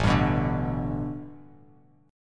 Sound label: A 7th Suspended 2nd Suspended 4th Bass Standard